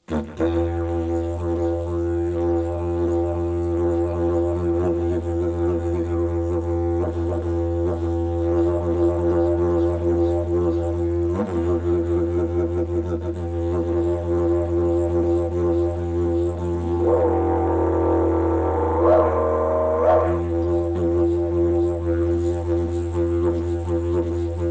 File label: Didgeridoo; Ravens Gate; Time Guardian